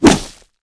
blunt hit high; Mount&Blade Warband

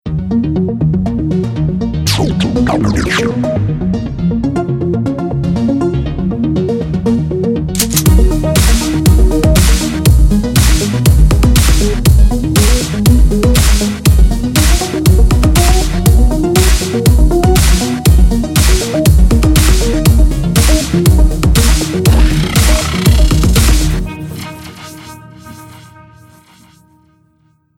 choose team, music; Counter-Strike Global Offensive

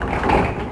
Wood Gears; Sid Meier's Civilization 4 Complete; Warlords; Units

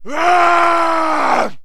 man yell; Mount&Blade Warband

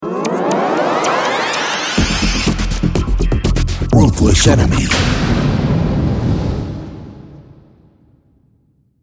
deathcam, music; Counter-Strike Global Offensive

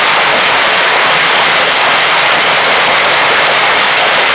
metal roof rain loop; ambient, ambience; Left 4 Dead 2

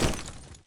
land; Counter-Strike Global Offensive

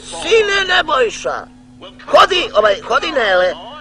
sine; Counter-Strike Global Offensive

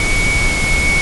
Turbo Whine, engine; Nissan VR38DETT; AssaultCube; Alien Stars; American Muscle Series

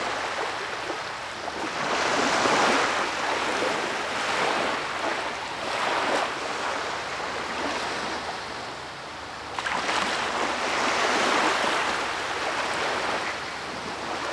Water Ocean Sand; GrandFantasia

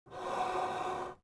Gasmask Inhale; Sid Meier's Civilization 4 Complete; Beyond the Sword; Units

